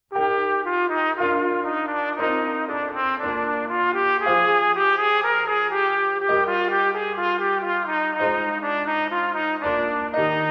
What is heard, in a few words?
Aria performance, Trumpet; canadian brass easy solos